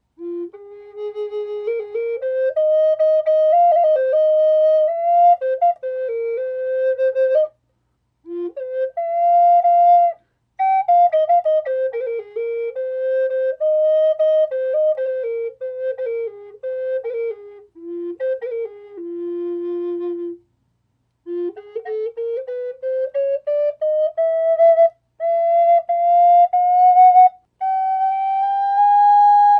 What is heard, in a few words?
Bocote, F minor, flute